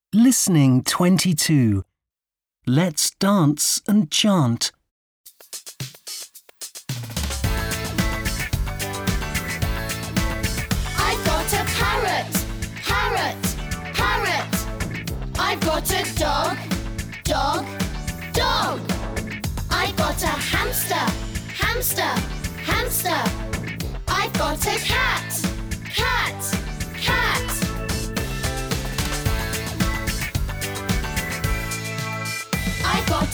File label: chant